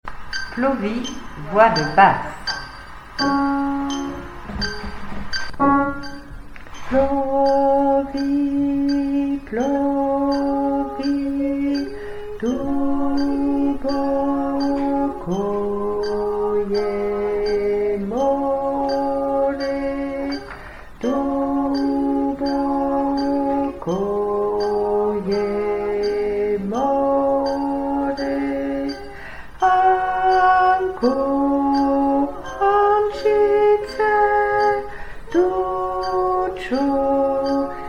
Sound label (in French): Basse